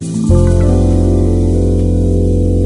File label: melody